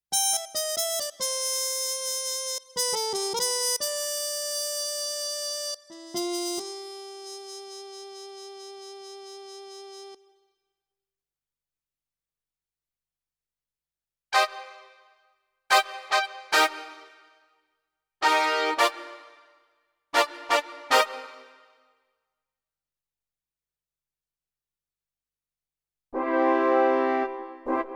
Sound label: Brass Woodwind